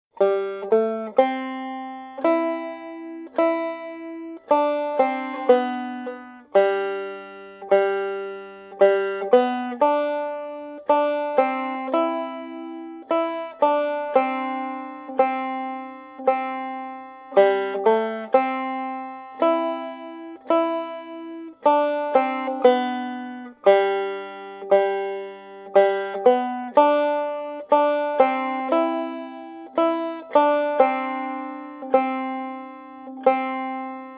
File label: Melody; Little Birdie